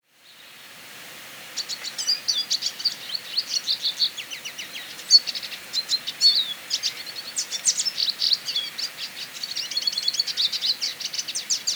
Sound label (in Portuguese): Canto do Tarin